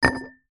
foley bottle glass milk empty set down on concrete ground; zapsplat